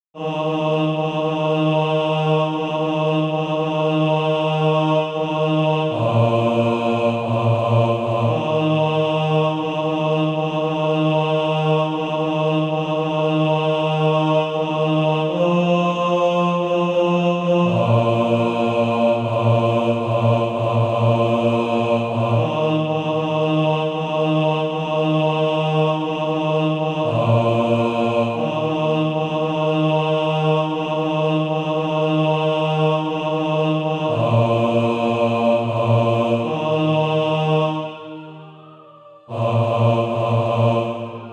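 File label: bass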